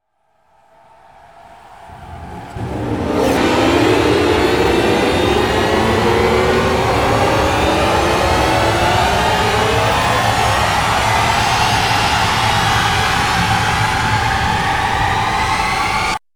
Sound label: glide rise